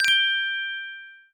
coin count end; netent

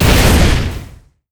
Hit Heavy Volcano; netent

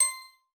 counter

